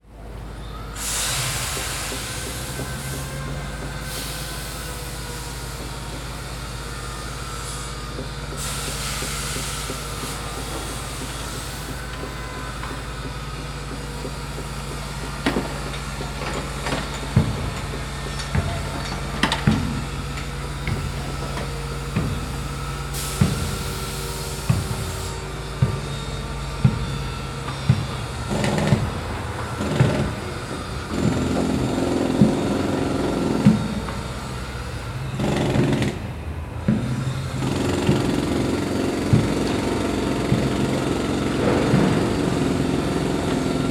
industrial construction site light slightly distant building work underway